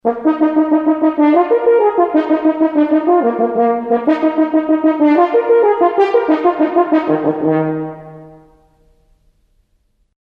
waldhorn

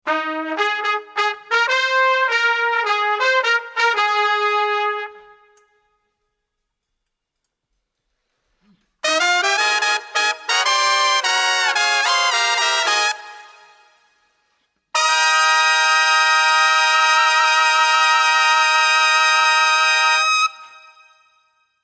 trompete

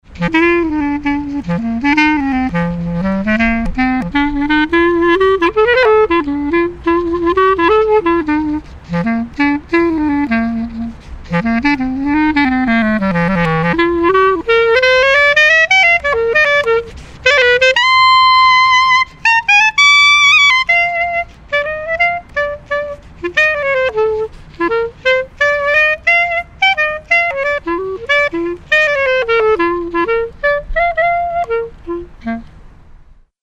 klarinette

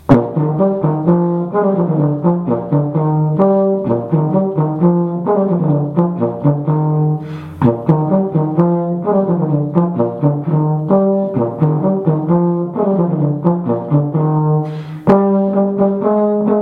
tenorhorn

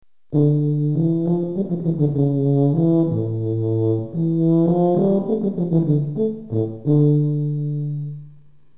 tuba

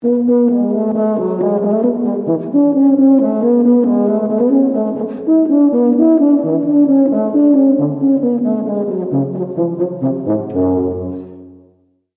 tuba

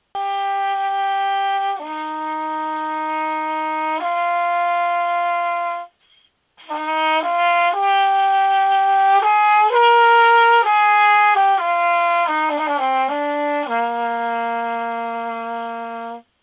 althorn

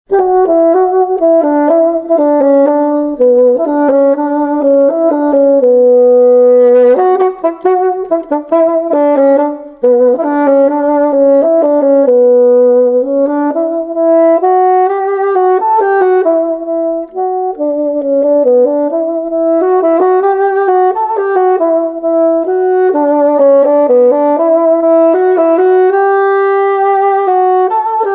fagott

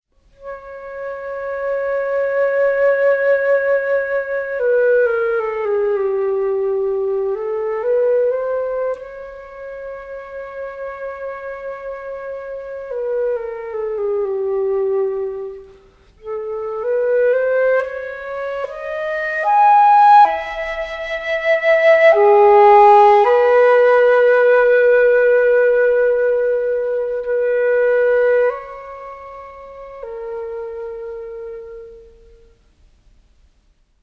querfloete

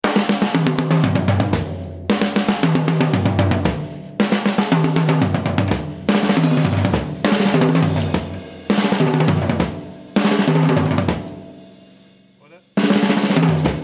schlagzeug

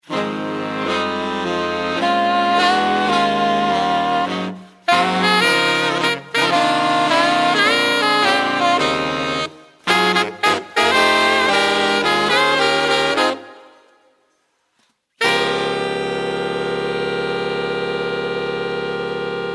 saxophon

